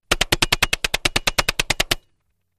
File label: Machine Gun Bullets I, rock